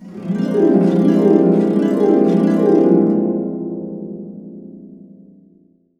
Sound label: BB CASC UP DN, Harps